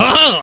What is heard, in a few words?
Krunch grunt